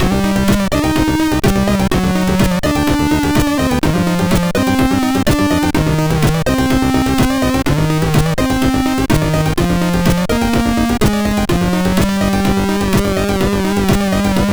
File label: Tribal, MOS6581R3